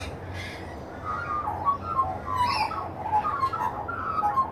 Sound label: magpie